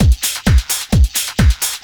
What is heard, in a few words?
drum 130 groove; Techno Trance Essentials